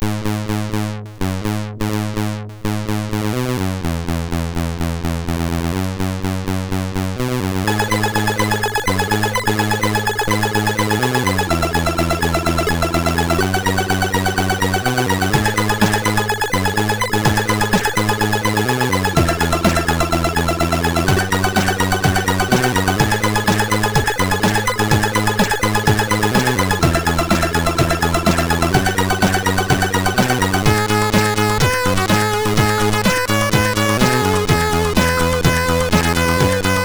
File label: Sweat Heart, sid MOS6581R3